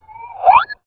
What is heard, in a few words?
alert, houndeye